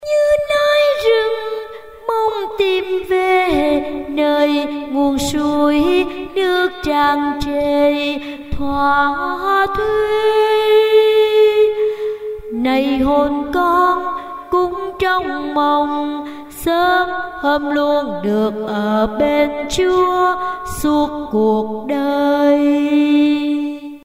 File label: alto basso